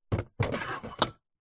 household drinking glasses new slide in or out of box